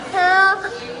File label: ahhh